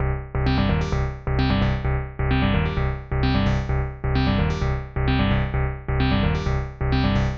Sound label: 130 Cm synth, Synth Loops, Trance